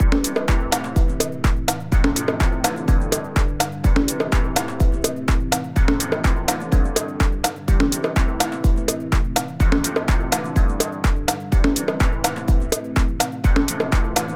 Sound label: loop